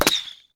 Gumball Blaster; Crash the Bash - Drink Break

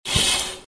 Curtain Up; Bunnicula - Ghost Guard

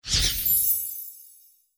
spell; Keep Out! A Dungeon Adventure for the Brave

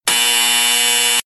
buzzer; Nickelodeon Basketball Stars 3; ThrillGames

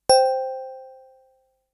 toy chime

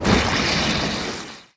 hit mud full-speed; The Loud House - Extreme Cardboard Racing; NextlevelGames

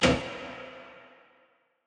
click; Lux Ahoy

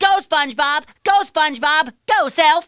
win; spongebob graffiti

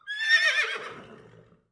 unicorn rainbow power random; Teen Titans Go! - Raven's Rainbow Dreams

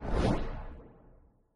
pickups launch; Bunnicula - Juicy Bites

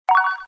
zombie; Phineas And Ferb - Backyard Defense

